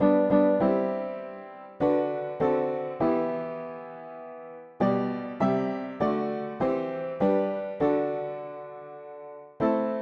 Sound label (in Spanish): mono piano; hymns; Himnario pequeno 2Pte